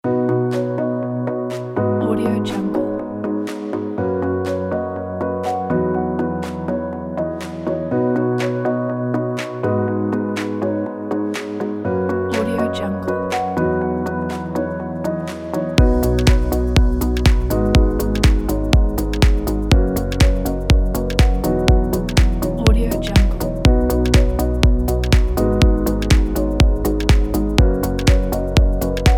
this upbeat corporate

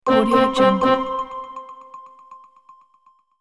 pleasant ui device notification

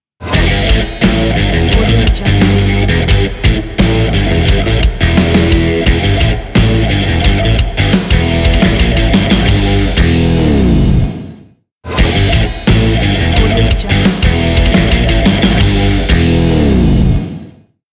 extreme and powerful

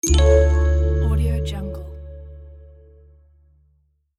magical question

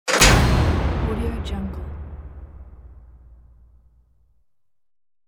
stadium spotlight

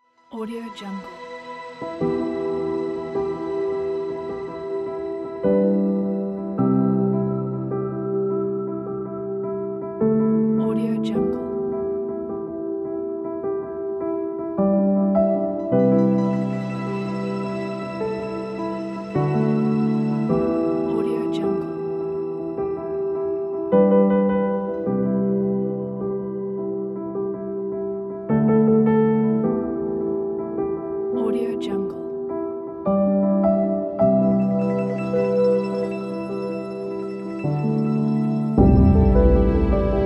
cinematic documentary

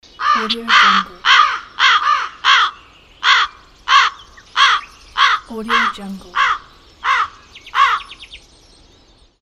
crow

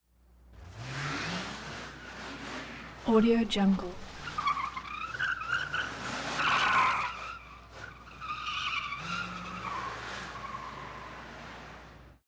cadillac tire screech fast approach maneuvers

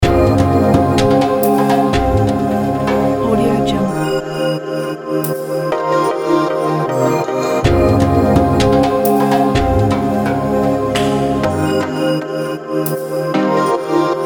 ambient emotional new age

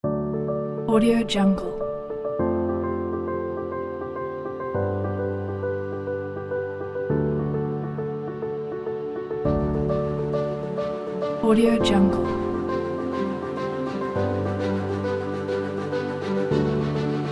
rise and inspiring; universound

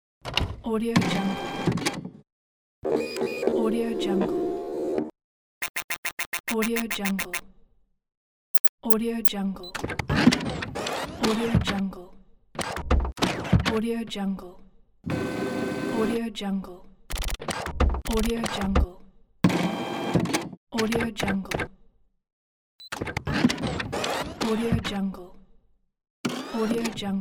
conceptual analog robot pack

